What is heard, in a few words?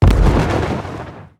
damage hits explosions; World Of Warships